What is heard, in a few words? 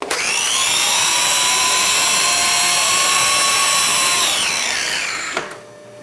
electric drill press operating and grinding